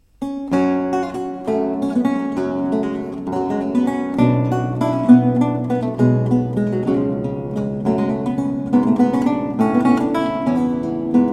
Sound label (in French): Gigue, Luth Baroque; Suite en Sol Mineur; Ernst Gottlieb Baron